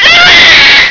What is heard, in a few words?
scream; chakan